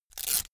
velcro large open quick

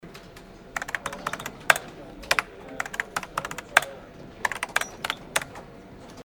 computer keyboard

